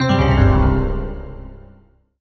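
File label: Solo Electric Piano Negative; MUSIC EFFECT; Solo EPiano Negative; startide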